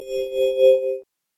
squiggle; patatap

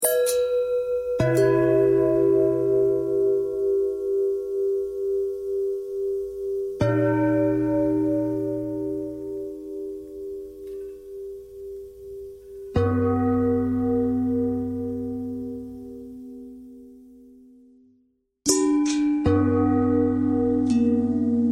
bell long bell thread noise, bells

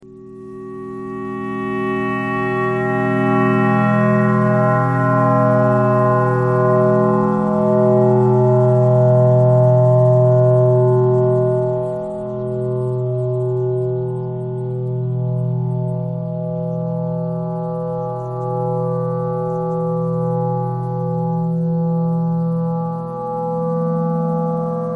clarinet thread noise

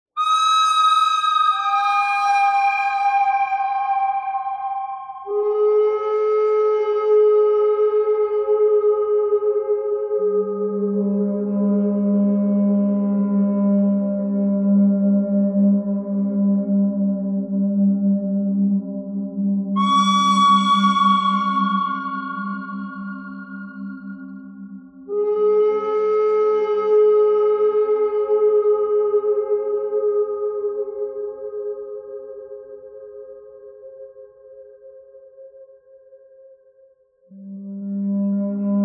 bird thread simple; birds

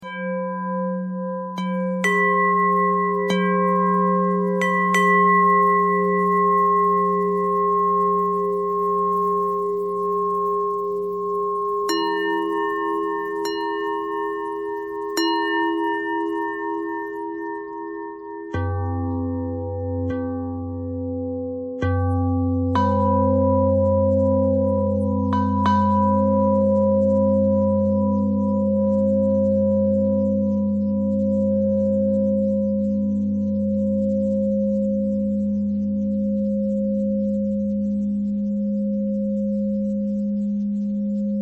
bell thread bend thread

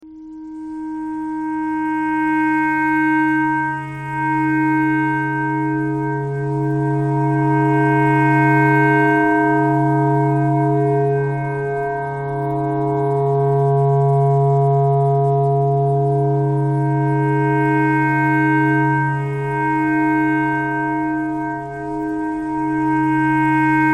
clarinet clarinet pentatonic

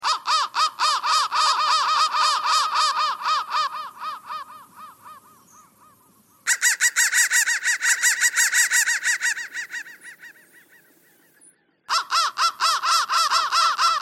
crow crow bend threads

